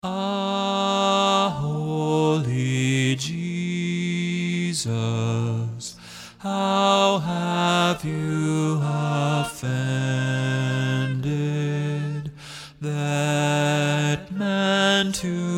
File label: Bass Prominent - Ah Holy, Vocal